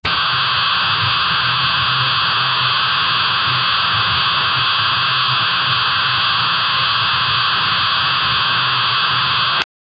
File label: lazer beam eyes from a, superhero